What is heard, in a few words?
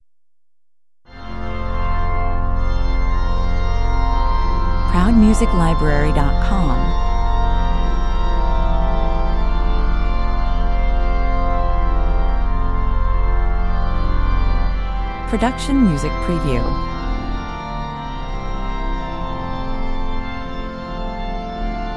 organ improvisation